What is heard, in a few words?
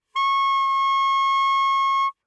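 Eb Clarinet fortissimo Db6; Woodwinds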